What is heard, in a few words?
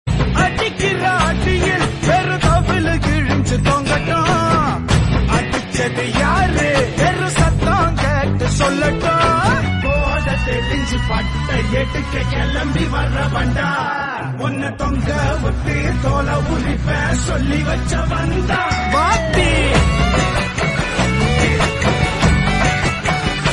Tamil Ringtone